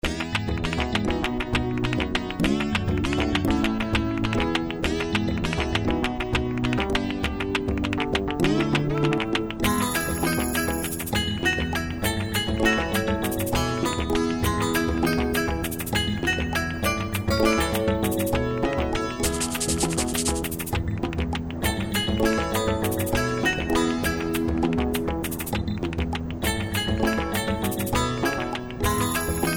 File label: BGM Desert; Adventure of Heroes; Android